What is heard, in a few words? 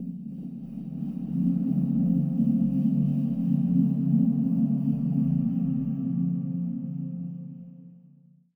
Pad; Ghosthack